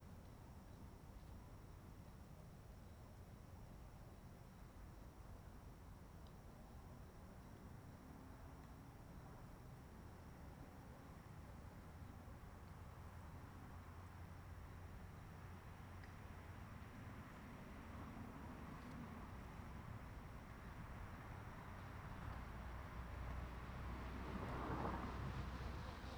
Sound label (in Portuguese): Transito bem longe bicicleta passa depois de carro